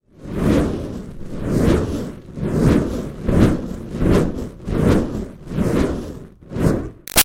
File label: Fire Torch Whoosh Spinning Strong; Flames; Objects